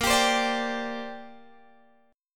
A sharp 6th Add 9th, Guitar, Open G; down

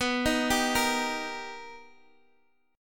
Eb Major B

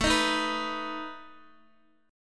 A Suspended 2nd Flat 5th A down Guitar Open D

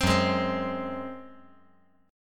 Gb Suspended 2nd Flat 5th 5G,4C,5E,5A

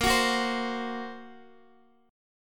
A sharp Suspended 2nd Sharp 5th, Guitar DADGAD

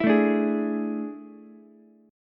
A Major 7th Suspended 4th, Ukulele; strummed

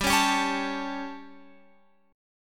G Suspended 2nd Flat 5th G Guitar Open F; Gsus2b5; strummed; x,14,13,14,16,16

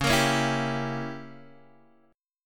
Db Diminished 7th Db down Guitar Open D

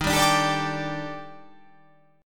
D sharp Major 7th Flat 5th D sharp, chord

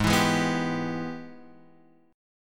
G sharp Minor Major 7th Sharp 5th G sharp down

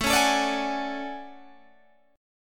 A Major 13th, down Guitar Standard; AM13 strummed

